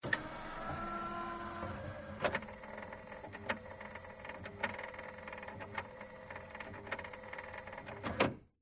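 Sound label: sounds of CD player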